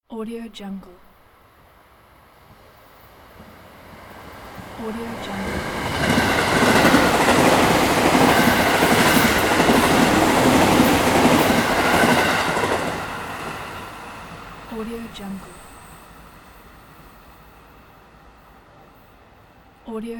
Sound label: train